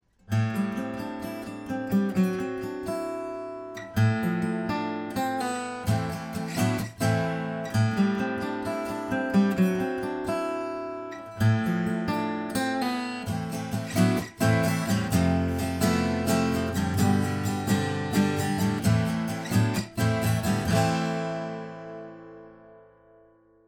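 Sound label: acoustic guitar; mercury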